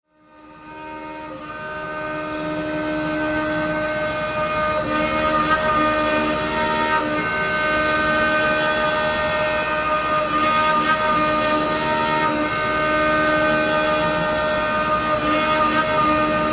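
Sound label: sounds of lathe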